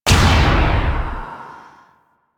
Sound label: Focus Madurai Cast Burst Operator Hand; Warframe